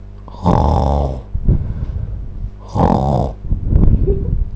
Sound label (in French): ronfle; Abuledu; leterrier